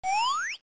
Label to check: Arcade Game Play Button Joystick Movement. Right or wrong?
Wrong